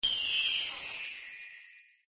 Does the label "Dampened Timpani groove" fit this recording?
No